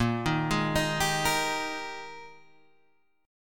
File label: Ab Major 7th Suspended 2nd Bb